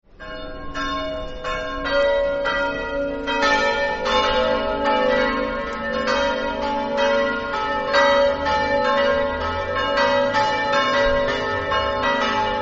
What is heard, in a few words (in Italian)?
campane